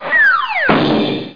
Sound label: falling; How Many Bugs in a Box